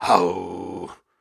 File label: Grunts and Chants, Viking Vocal